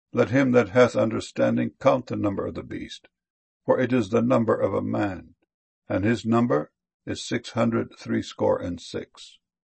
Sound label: harping